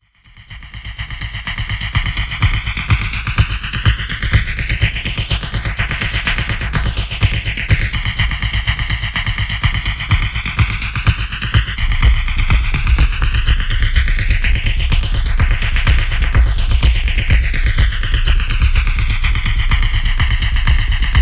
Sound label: Snare Roll; Fragil Musique